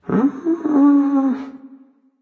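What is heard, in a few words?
non verbal thinking, voice; cernunnos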